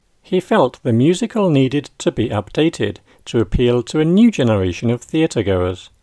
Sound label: rap song